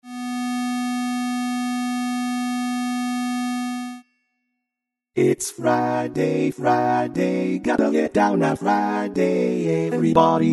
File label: Bass, barbershop tag